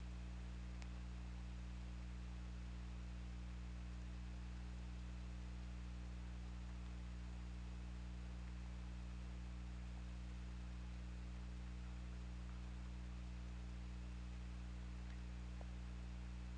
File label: Orca; OrcaLab